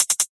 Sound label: hat, drum sample; Hats